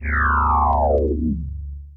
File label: Moog Synth